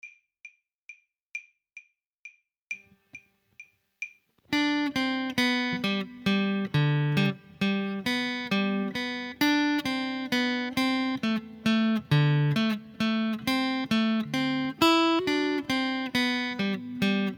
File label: Irish Jig; Guitar Combo